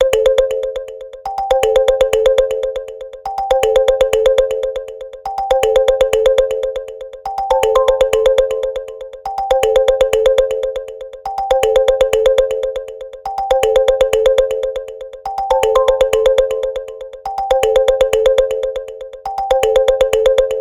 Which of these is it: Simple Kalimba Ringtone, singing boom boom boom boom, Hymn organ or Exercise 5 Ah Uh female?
Simple Kalimba Ringtone